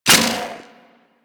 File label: Grenade Launcher Reload; Warframe; Weapons; Corpus